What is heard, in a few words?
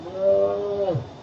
attack; zoochosis pack1